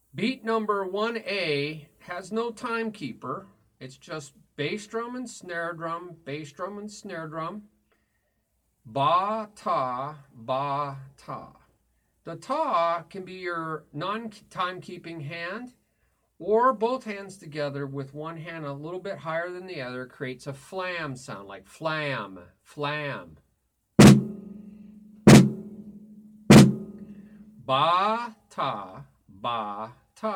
Beat Ba Ta Ba Ta

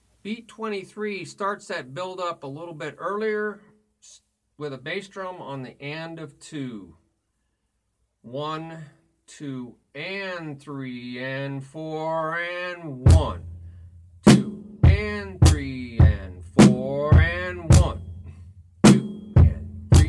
Beat Toom Cha Ba Toom Ba Cha Ba

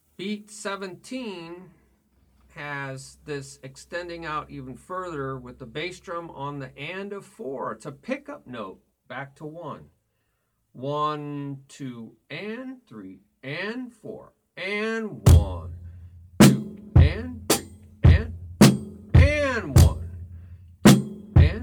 Beat 1 2 3 4 Toom Cha Ba Ti Ba Cha

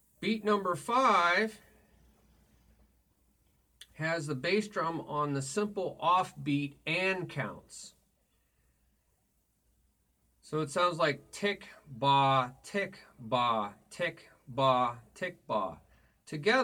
Beat Ti Ba Ti Ba Ti Ba Ti Ba

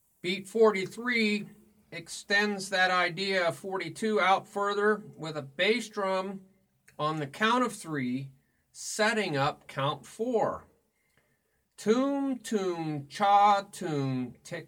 Beat 1 2 3 4 Toom Toom Cha Toom Ti Toom Cha Ti

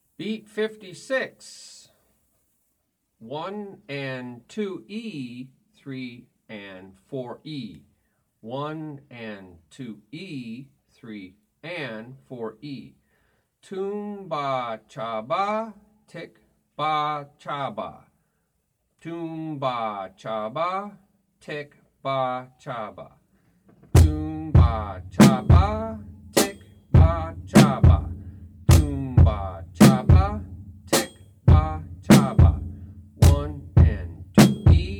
Beat Toom Ba Cha Ba Ti Ba Cha Ba

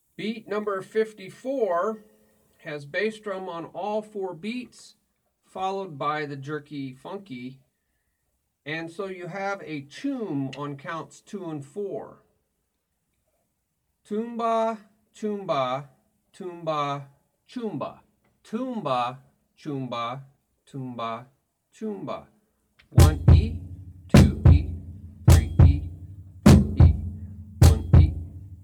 Beat Toom Ba Choom Ba Toom Ba Choom Ba

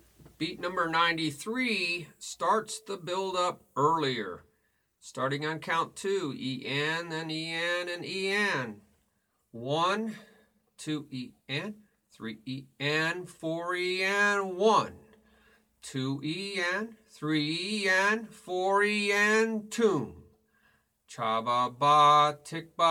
Beat 1 2e 3e 4e Toom ChaBaBa TiBaBa ChaBaBa

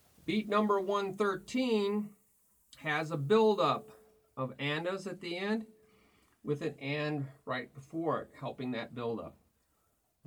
Beat 1 2 3 a4 a Toom Cha Ba Ti Ba Ba Cha Ba Ba

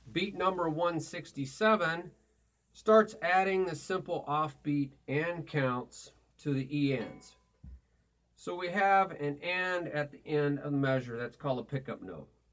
Beat Toom Ba Toom Cha Ti Ti Ba Toom Cha Toom

